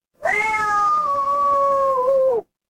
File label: animal cat meow